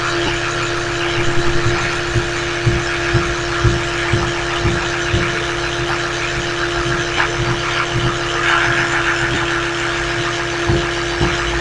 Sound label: modulation electric toothbrush; thinking recorded; I used a wireless microphone to record this experiment